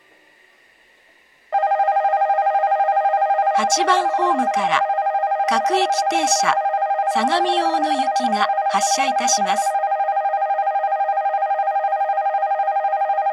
shinjuku local sagami ono; station

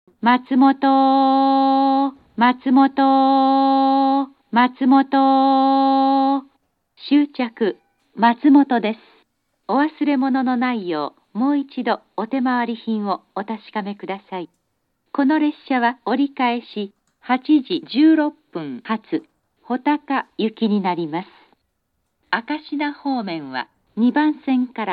matsumoto turn local, station; hotaka; jr-east

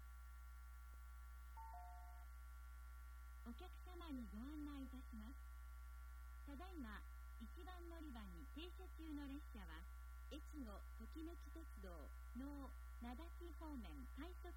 rapid naoetsu, itoigawa station; echigo-tokimeki-railway

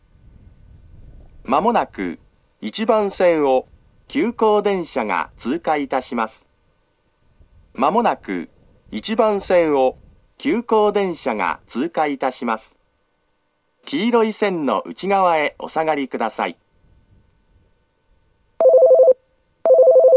pass express, station; tsukimino

